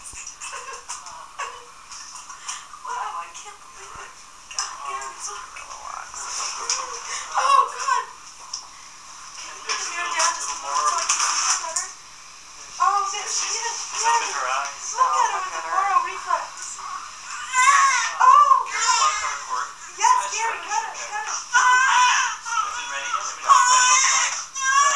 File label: cry